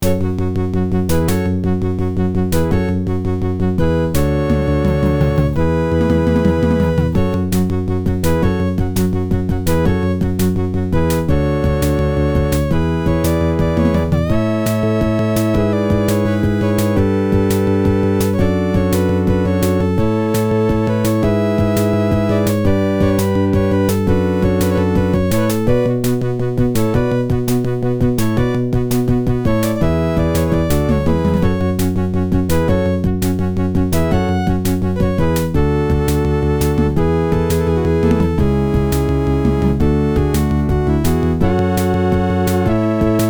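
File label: SB CT2770, Sound Blaster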